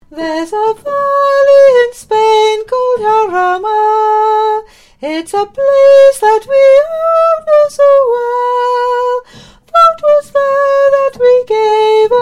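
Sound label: Soprano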